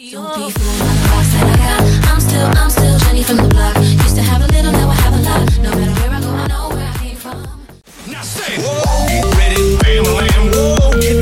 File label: Future House Mashup Megamix 122 126